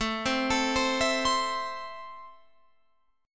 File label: A Minor, Guitar, Open F; arpeggiated